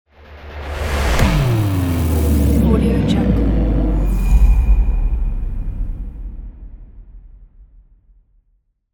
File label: portal opening